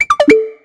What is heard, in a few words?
KEY Hold Finish